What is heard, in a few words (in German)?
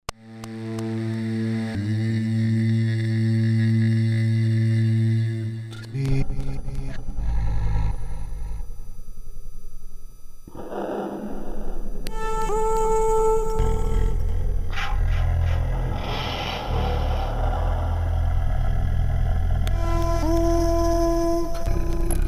Loop Längen Mod